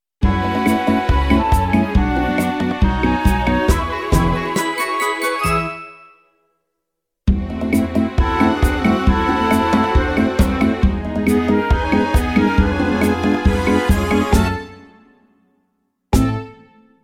ACCOMP audition cut

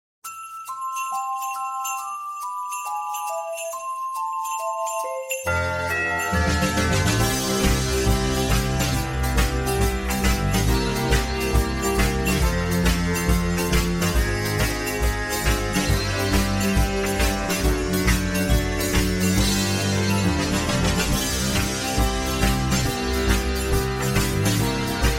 ACCOMP in c shorter

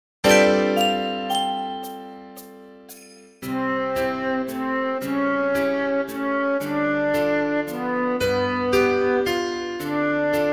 Spirit Of Christmas ACCOMP BOT

